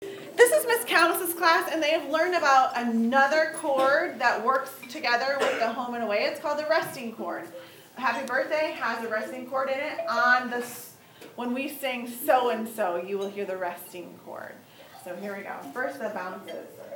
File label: happy birthday xylophones